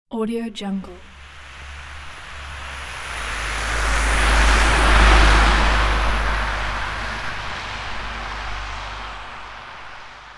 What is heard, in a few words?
bus passing on empty wet road; fxprosound